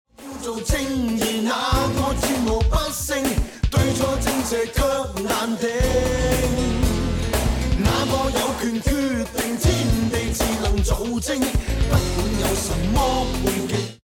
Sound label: choose team; music kits custom